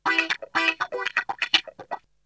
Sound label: Guitar; ZERO G - Datafile DISC 3